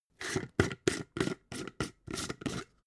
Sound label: jar glass plastic open